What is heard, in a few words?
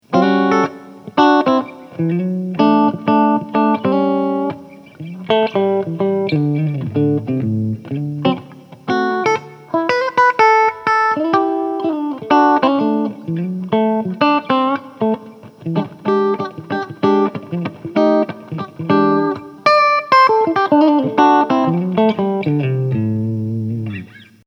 Tech 21 clean